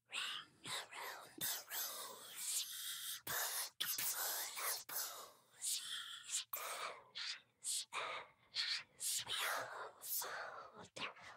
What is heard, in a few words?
song whispered; Devour 2021 gamerip pc macos